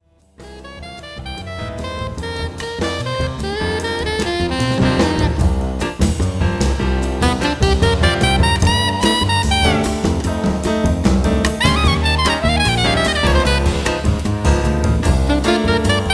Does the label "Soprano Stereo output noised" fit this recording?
No